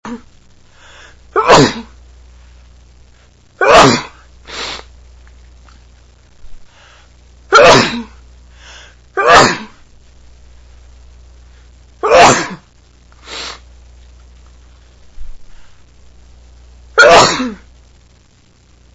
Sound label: watery eyes